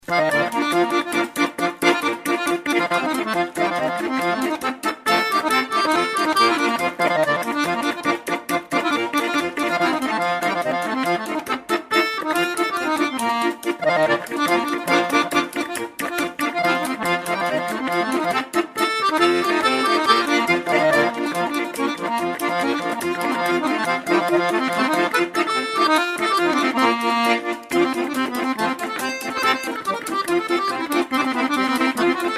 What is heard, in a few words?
Clarinet Polka